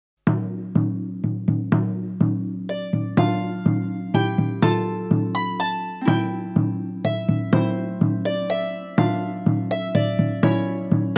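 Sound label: Greensleeves, ukulele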